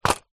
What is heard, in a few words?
household medicine antibiotics box set down on table